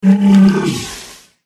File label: zorb attack voice middle